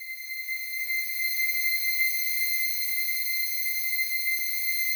slow strings C6, Yamaha VP-1; 90 s Sample CDs; Music Store

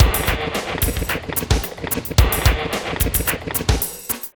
Beat, Drums & Percussion; Electrocution; Beats

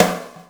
Snare ambience, drum hits; Zero-G; Soundclash

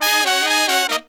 132, Pop & Funk Brass; Best Service ProSamples vol.25; AKAI

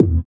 SITAR; Spectrasonics; Metamorphosis; INDIATEK

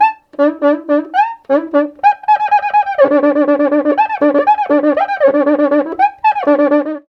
Rio Batucada, 120

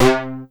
arpeggio; Masterbits; Vintage Voltage; SEQ-FX

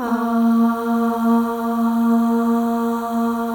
Voices, FEM AHS; Spectrasonics; FEM.1 AHS

